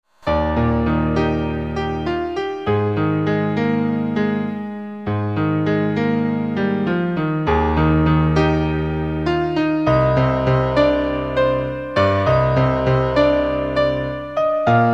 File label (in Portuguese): canção de maio para piano